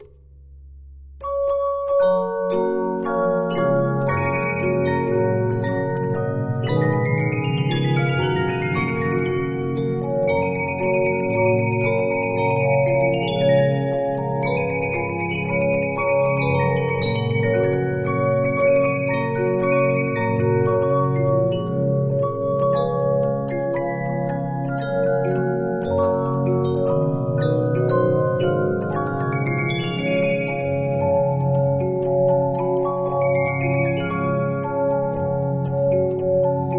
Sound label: Regina, 15inch disc musicbox